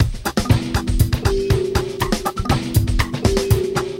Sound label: Jazzy Bar Loop